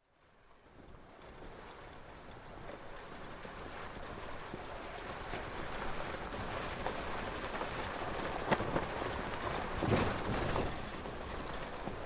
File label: Car Tires Rolling, snow tires